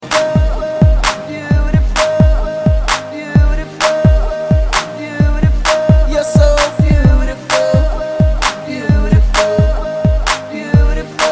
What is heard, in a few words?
beautiful beats, ringtone